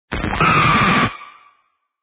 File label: scratch & skid fx